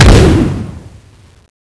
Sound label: plasma exd, weapons